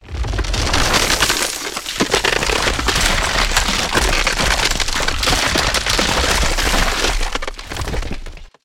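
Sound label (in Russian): треск и обрушение ледяной фигуры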